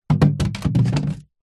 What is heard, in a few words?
container water plastic empty drop ground; foley